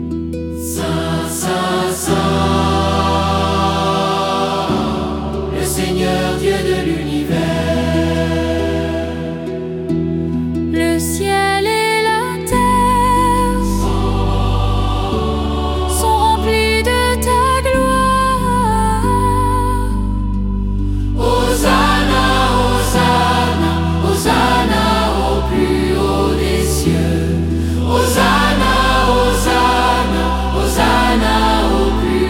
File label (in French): Saint Saint Saint